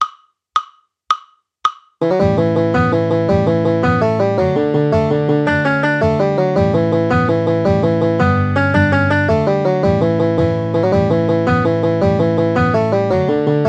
swallowtail jig, banjo

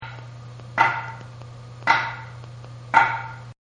tap note

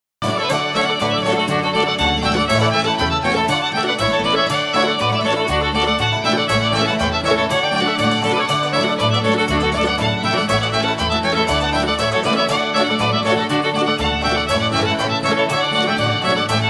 old french, fiddle

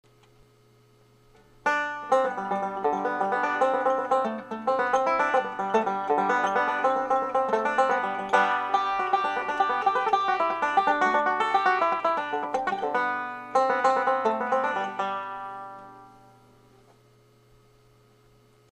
irish wash, banjo

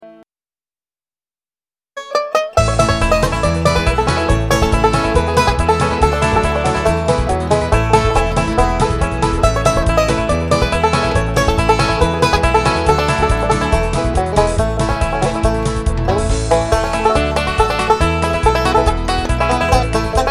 banjo

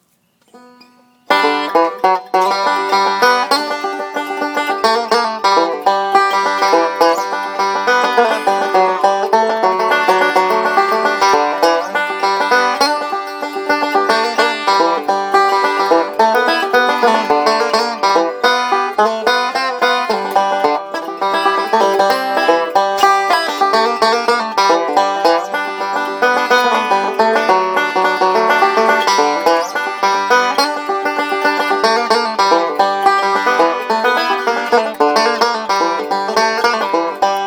banjo

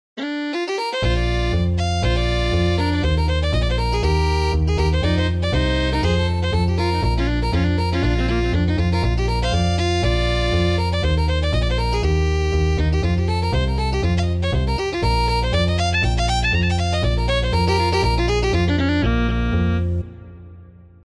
fiddle

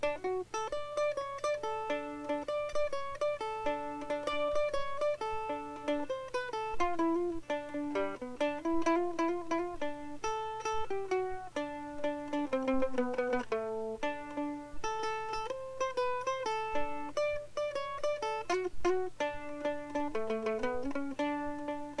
blue grass

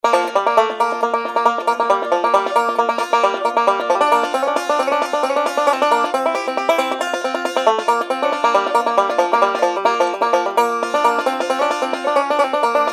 tenor, banjo

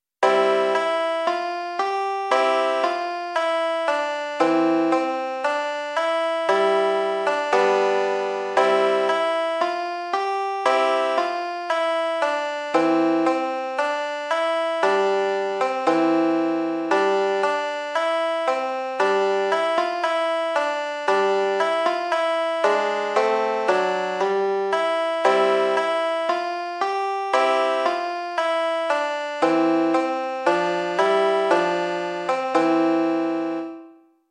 tab ode to joy, banjo

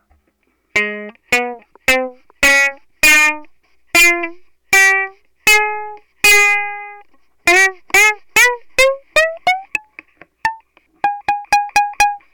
roland pd7

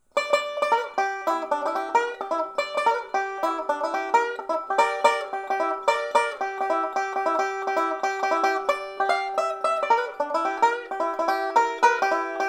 banjo

